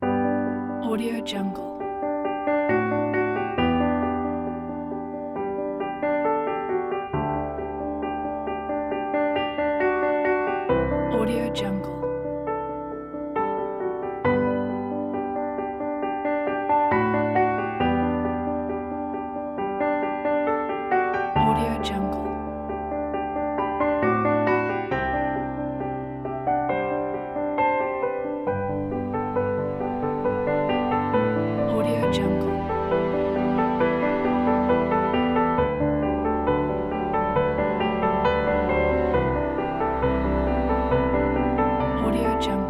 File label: piano uplift